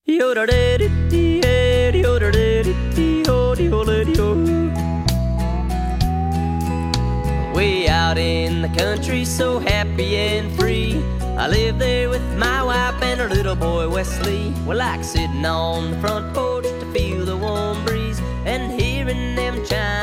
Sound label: Chime Bells